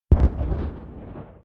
big explosion distant

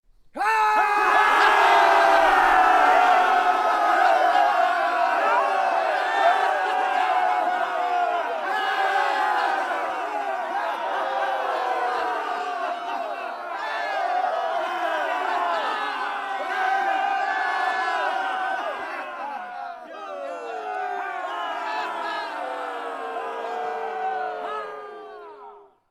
battle crowd celebration

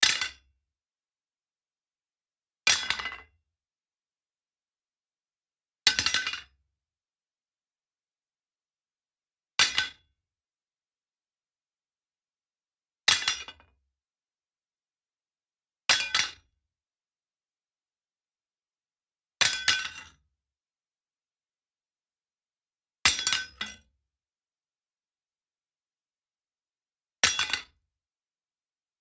knife drop

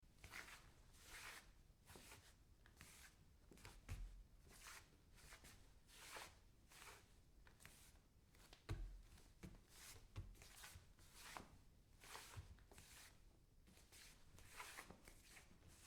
bare feet scuff wood

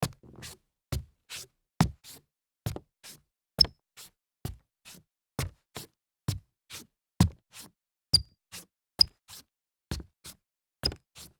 sneaker toe slides hollow wood, foley

